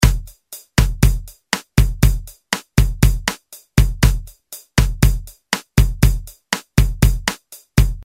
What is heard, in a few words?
BOSANOVA, Roland R-8